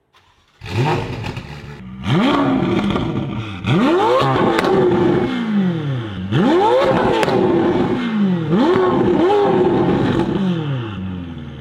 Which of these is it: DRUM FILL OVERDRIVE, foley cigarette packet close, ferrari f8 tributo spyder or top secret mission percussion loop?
ferrari f8 tributo spyder